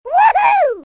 Cute Woohuu

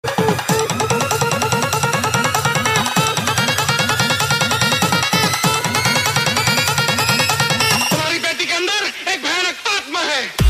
trance

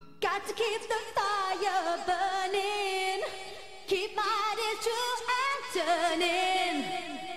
Chime

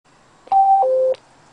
Sepura; Siresp

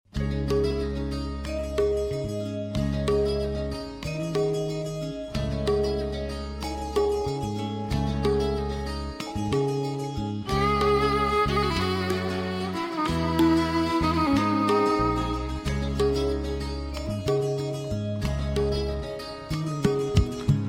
Instruments

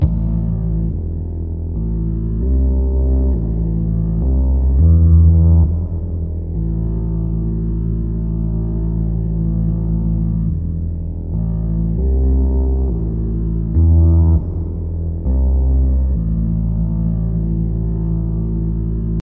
Spin Bass

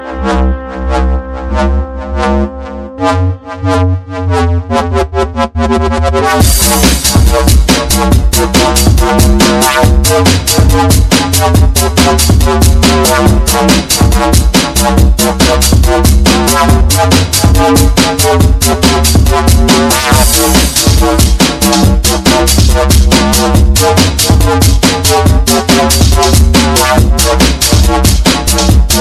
Drum N Bass

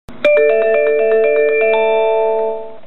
Osaka Train Arriving

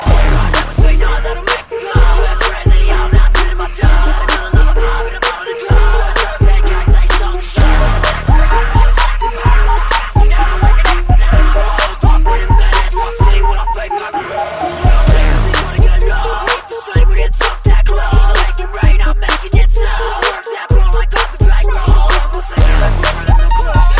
Punk

